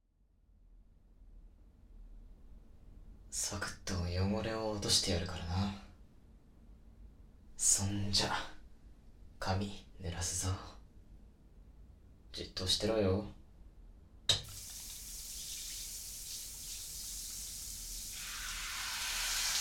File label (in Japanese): お風呂